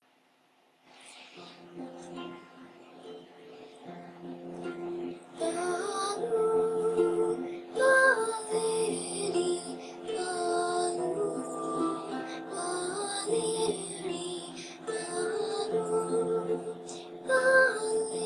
Scottish Lullaby; Baloo Baleerie

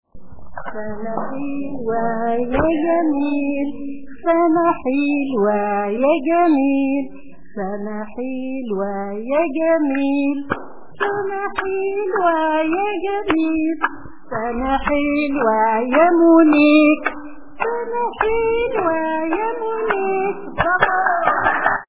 happy birthday arabic

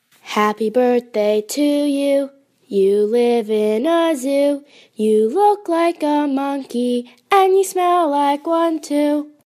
happy birthday to you joke version

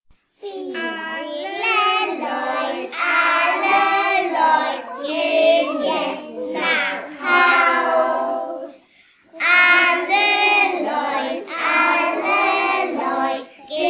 kids singing alle leute

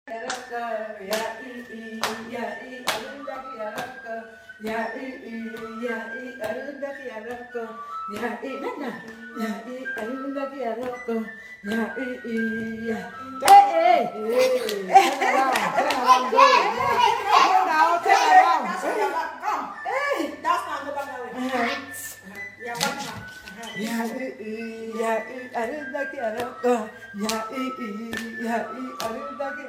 oroko greeting song

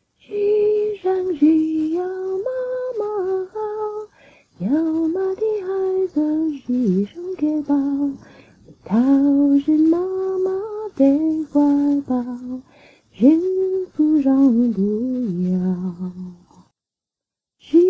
chinese lullaby

